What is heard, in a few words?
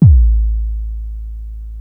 kick, Chroma Polaris; Rhodes